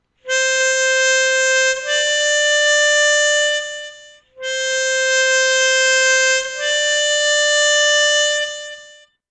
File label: 4h blow draw